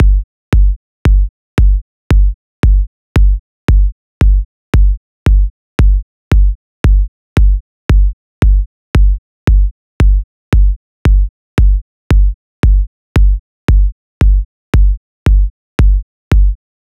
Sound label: 114 G Kick